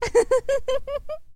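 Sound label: woman laugh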